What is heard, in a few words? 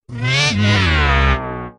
Yawn Voice; Maxwell